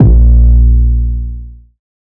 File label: 808 Bass Wobbler Bb3; Slate 808 Deep Dive; SD Signature 808s